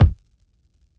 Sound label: Hiphop kick; Reverb+EQ+Comp+Limit